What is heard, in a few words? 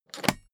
suitcase close latch lock fast